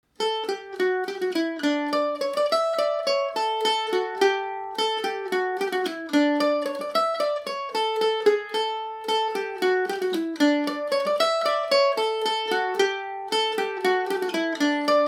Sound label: dan o connells polka dmaj, mandolin